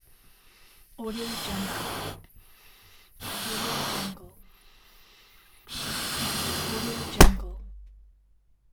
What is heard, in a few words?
balloon inflation and burst